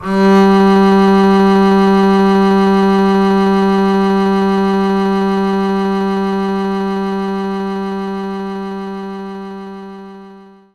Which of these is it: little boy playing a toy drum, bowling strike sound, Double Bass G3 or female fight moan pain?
Double Bass G3